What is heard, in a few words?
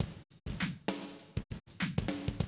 drum loop, 100 BPM